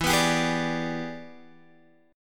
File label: E 7th Sharp 9th Guitar Open G, chord